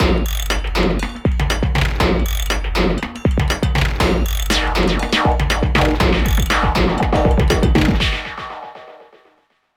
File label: Jackhammer; PMSS